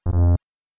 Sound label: wrong; smrpg